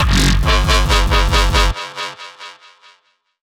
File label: dubstep club bass